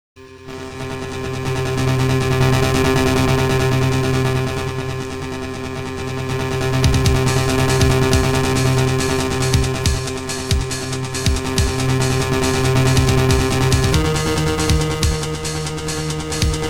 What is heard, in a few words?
Suspense; Gyakuten Saiban; Gyakuten Saiban 2 Original Soundtrack